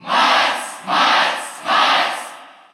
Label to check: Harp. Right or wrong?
Wrong